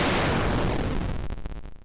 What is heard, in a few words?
woosh